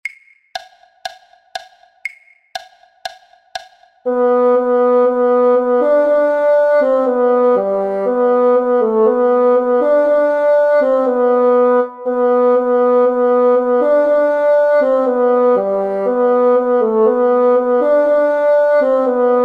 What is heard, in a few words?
BASSOON; Easy Bassoon Christmas Carols Book One